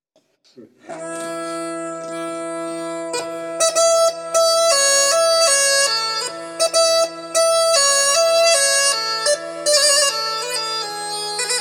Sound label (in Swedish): Marsch; efter Harv Piggs Olof Dala Folda; bröllop, dop och begravningar